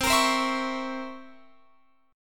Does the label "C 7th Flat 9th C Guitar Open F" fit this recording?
Yes